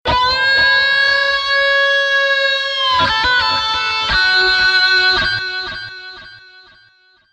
sms solo

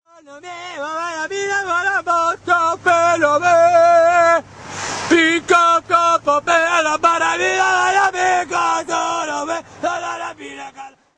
singing like a madman

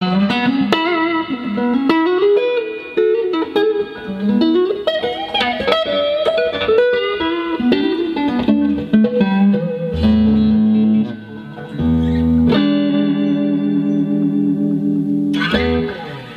64 Fender Stratocaster

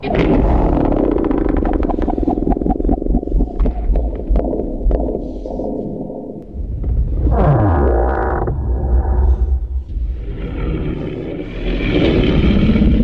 Female Muto Noises

